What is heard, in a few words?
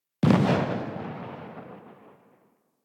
Fire Tail, Weapons; Strun